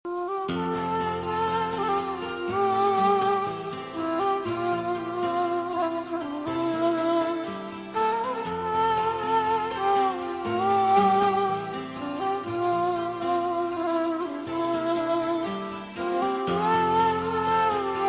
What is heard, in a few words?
nice classical music